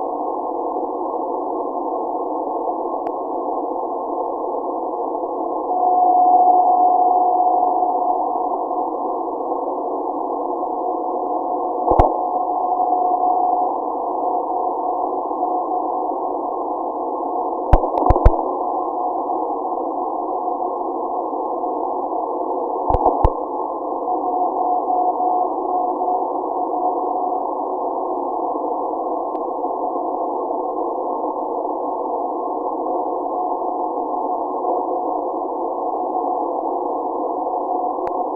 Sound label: Beacon